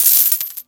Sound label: cash pickup